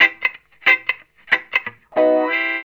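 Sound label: reggae electric guitar C; Best Service Advanced Media Trax Modern Composer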